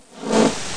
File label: Screen Static On; Let's Discover Tennessee!